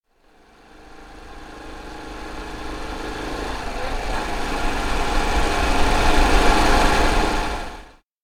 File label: bike approach; anemoi